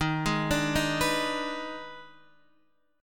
Eb Major 7th Suspended 4th Sharp 5th, Guitar Open D; down